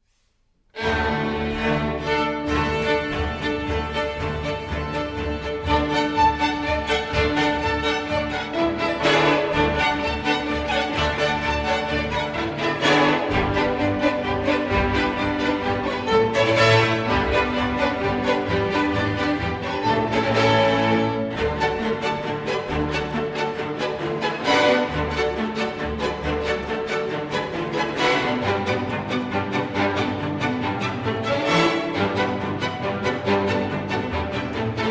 Romanian Folk Dances; 6 Romanian Folk Dances, BB 68, Sz. 56; Bartok; No. 5, Poar

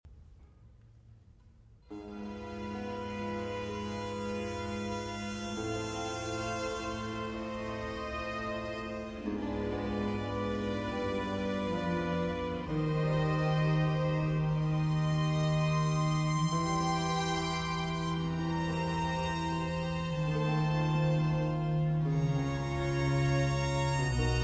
Concerto For Strings and Continuo in D Minor

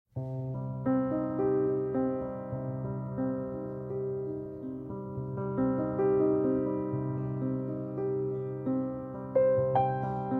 Ballade in C Minor

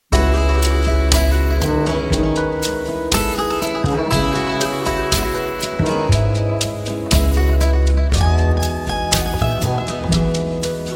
Instrumental Jazz

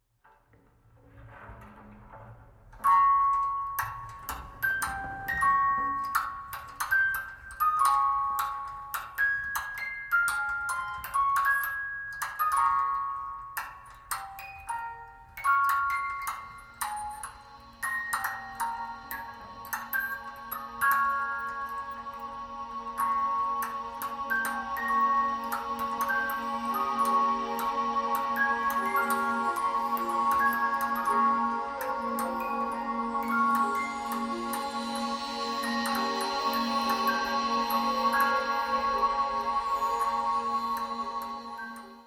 Music Box

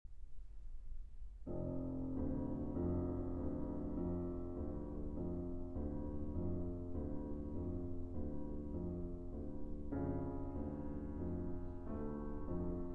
Piano Pieces Mässige Achtel; 3 Piano Pieces, Op. 11; Schoenberg

